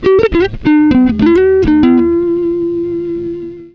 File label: SOLO LICK, Gtr; PlatinumPhattCD2; E-MU Producer Series Vol. 8 – Platinum Phatt